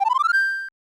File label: Small Item; BS Zelda; SNES